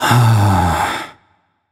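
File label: sigh, non-verbal; voicepack; smite; merlin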